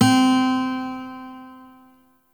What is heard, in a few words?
Shawzin Oct Two C; Warframe; Lotus Shawzin